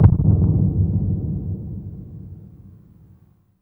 THUNDER FUZZ, SPACEY DRUMS; Spectrasonic Distorted Reality 1

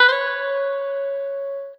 Guitar & Bass; Quantum Leap; 90's Sample CDs

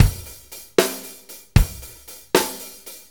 6-8 Loop, Full Kit Loops; Drum Tools

